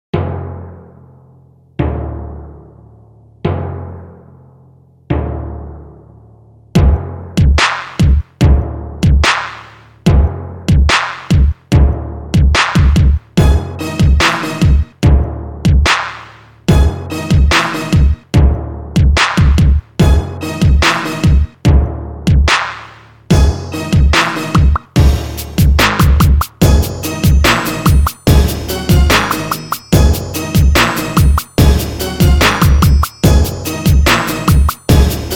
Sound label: guitar nice beat